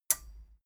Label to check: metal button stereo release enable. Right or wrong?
Right